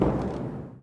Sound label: step metal; OneShot Fading Memory